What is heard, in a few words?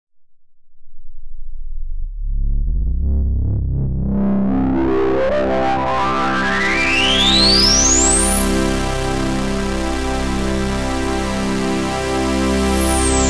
extreme; fabfilter volcano2